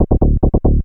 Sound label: moog; TechnoMusicMaker